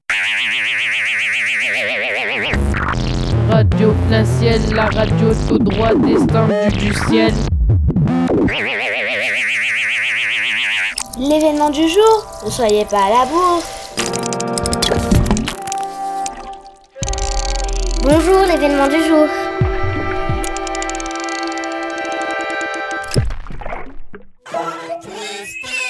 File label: jingles